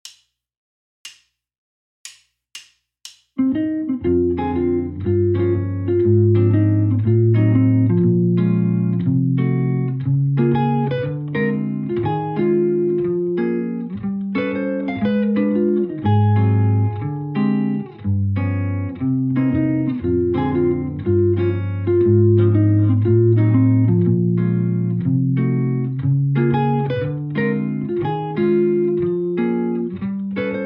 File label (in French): 2 guitares guitares 1 et 2